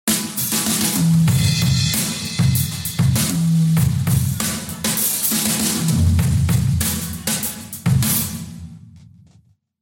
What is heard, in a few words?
drums ringtone